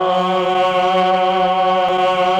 CHO F, CHOIR; Best Service ProSamples vol.55 - Retro Sampler; AKAI; CHOIR+STRING

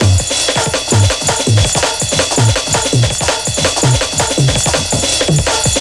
165, drum loops, drum & bass; Total Drum&Bass

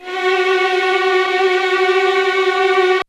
HF TRLL F#4, Violin; Q Up Arts; Denny Jaeger Master Violin Library; PERFORMANCE

